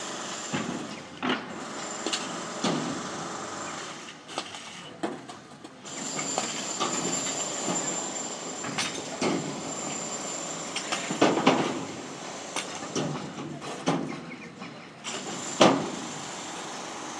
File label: quiet